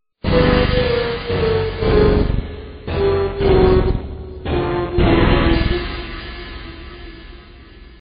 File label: miss music; vapesquared